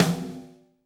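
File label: snare drum, Drums; Bob Clearmountain Drums I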